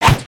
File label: Sword Slash; aronwk collection; ndaudio